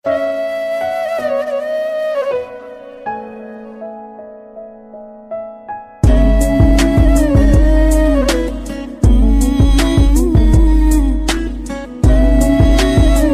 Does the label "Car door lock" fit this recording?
No